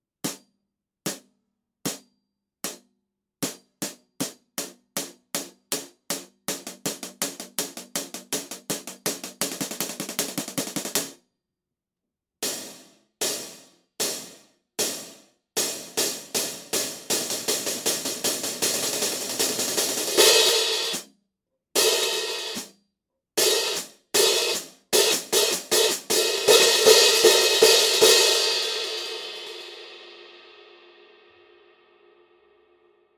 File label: Jazz Hats thin, hi hat cymbals